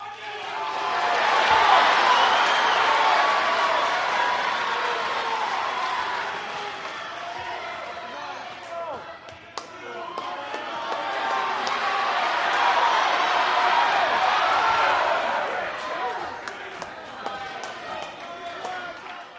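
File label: Crowd Walla During Match, boxing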